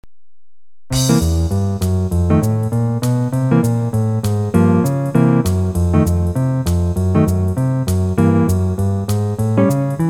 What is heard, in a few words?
Blues in C